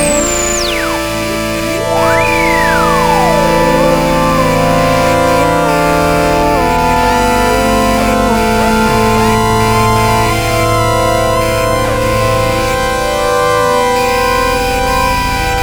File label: shoreline, noise loops; Loops recorded mostly with a loop pedal, others made in audacity and software of that ilk